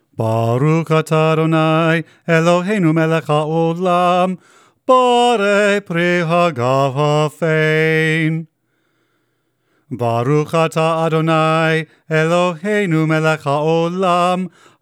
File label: Kiddush, hebrew liturgy